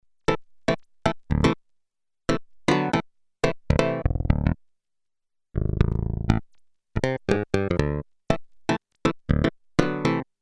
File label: clavinet, synth Roland alpha juno